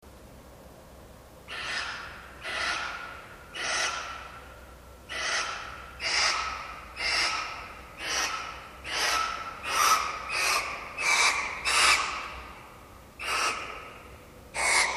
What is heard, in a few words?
Hisses